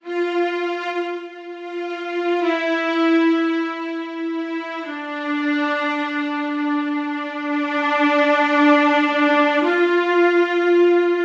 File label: viola strings